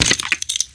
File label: ammo pu grenade; Spearhead Demo; weapons foley